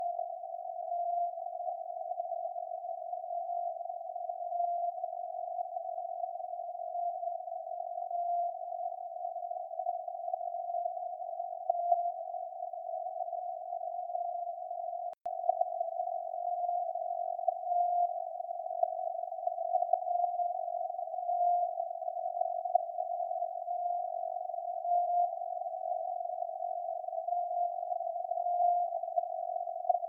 CW Alpha Signale; G33DDC; 11.9khz; Afedri

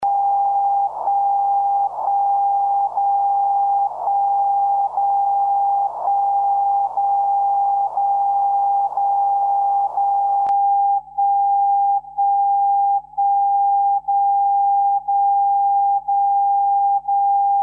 Zeitzeichen Bandbreite 80Hz CW; 75khz

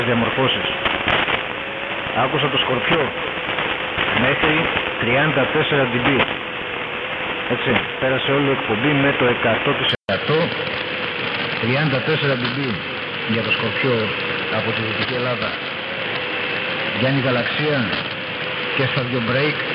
1700khz AM Griechischer Pirat

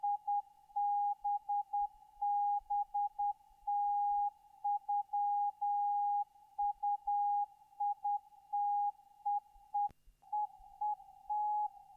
CW Bake; Pocket; DK0TEN

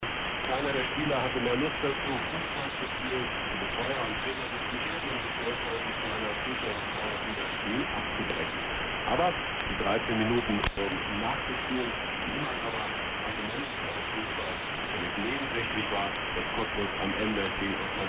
Kurzwelle 25m 12025khz; Deutsche Welle

